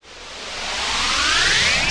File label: Missile; ASUS; P4B533; Intel 845E ATX